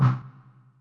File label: scrub, Yamaha Tenori-On; Scrubkit